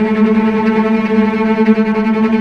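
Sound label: tremolo, strings; East West; The Ultimate String Collection; VCS TREMOLO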